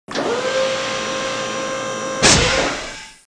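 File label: door close; Babylon 5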